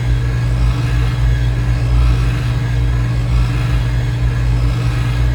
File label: Star Base Intro Lift Loop